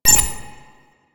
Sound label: flying robot beep; Warframe; Quests; Veilbreaker; Ambience; Kahl Quest